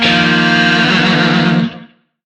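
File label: Shawzin Pentatonic Minor Chord; Warframe; Lizzie Shawzin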